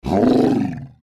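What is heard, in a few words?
level three death; steamcommunity; saliengame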